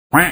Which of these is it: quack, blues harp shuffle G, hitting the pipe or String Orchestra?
quack